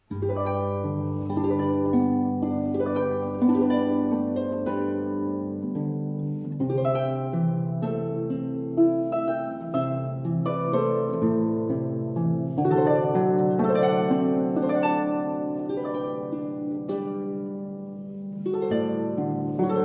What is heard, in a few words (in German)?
Weihnachtsjodler